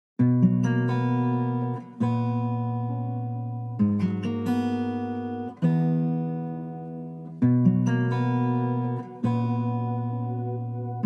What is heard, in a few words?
Clean Karaoke, 133 BPM; BasserMusic Studios